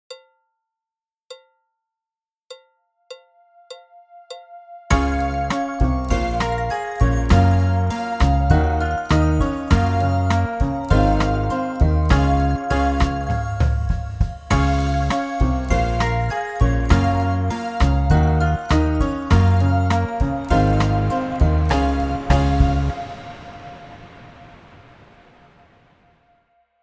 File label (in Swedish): Vattenfall, trombon trumpet